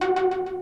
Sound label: synth; osu